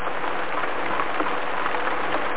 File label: fountain